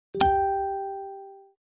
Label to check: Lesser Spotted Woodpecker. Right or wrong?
Wrong